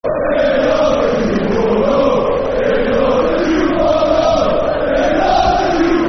Pogo If You Love The Toon, Football Chant (Soccer Song); Newcastle United Nufc